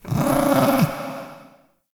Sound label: Ambient Meow; Characters; Warframe; Kalymos